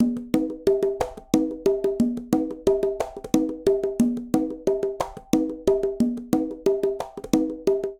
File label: Afro Cuban Quinto Conga Tumba 120bpm; Latin Percussion; Ableton; Factory Packs